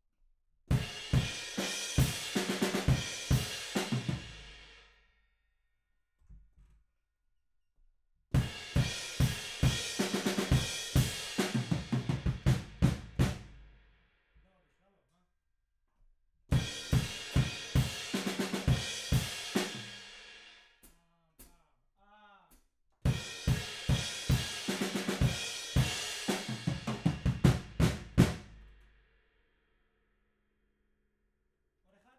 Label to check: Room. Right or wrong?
Right